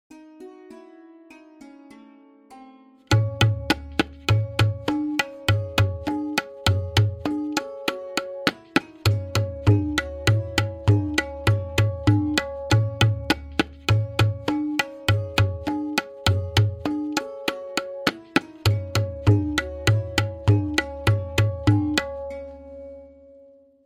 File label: Variation 8-Played with Lehra, Benares Kaida 1, Tintal; tabla